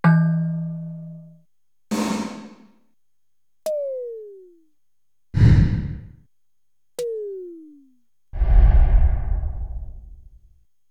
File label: Zoom MRT-3